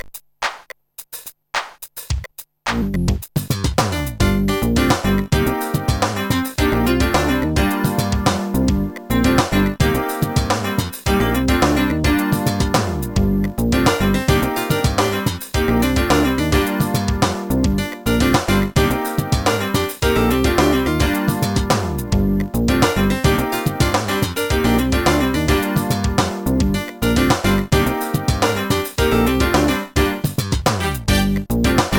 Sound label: bari and horn; Trackers; Modland